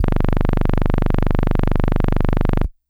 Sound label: Hard Brass DX100 G0